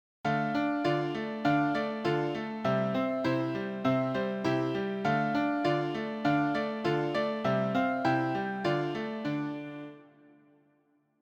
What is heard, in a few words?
simple time 4 4